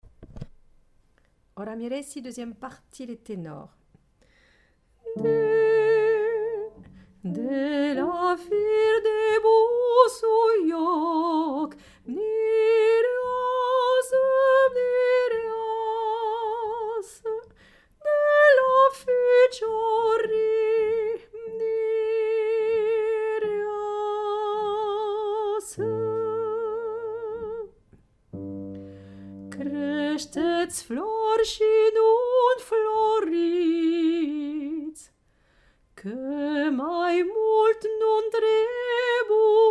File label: Tenor